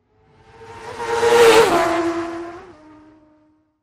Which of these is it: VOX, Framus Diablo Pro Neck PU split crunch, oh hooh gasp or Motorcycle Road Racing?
Motorcycle Road Racing